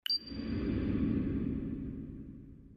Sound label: wuf